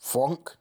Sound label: short; Fwonk; algorave